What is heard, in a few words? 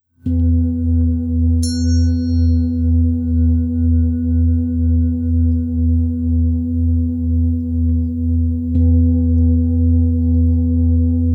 Chant To Kuan Yin